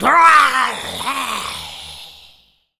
Male Berserk, Zombies; zps; Male1

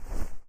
Glock Draw; zps; Weapons; Firearms

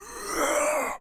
Zombies Male No Use; zps; Male1; No Action

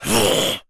Jump, Zombies; zps; Carrier

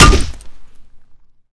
Aluminum Hit, Bat; zps; Weapons; Melee

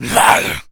Attack, Zombies; Attacks; zps; Carrier

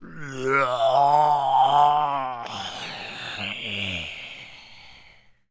Male Taunt, Zombies; zps; Male1

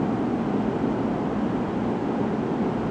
Wind Parking Garage; zps; Ambient

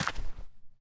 dirt, Footsteps; zps; Foley

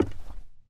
woodpanel, Footsteps; zps; Foley

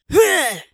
attack, Zombies; zps; Vanessa

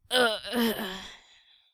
Death Rattle; zps

